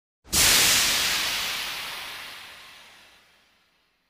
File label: Звуки пара Sounds of steam